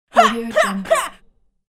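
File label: girl combat shout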